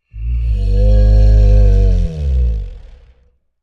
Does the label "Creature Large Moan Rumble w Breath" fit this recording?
Yes